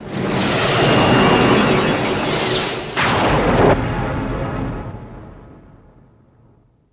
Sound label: Starship Entering Warp Speed